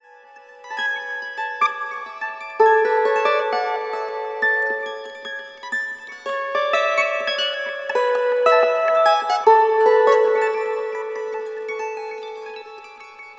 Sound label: Campfire - The Burning Psaltery; A phantasmagorical piece for an innocent and unsuspecting 12-string psaltery.; DreamStreet Press